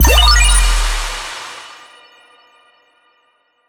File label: ui buy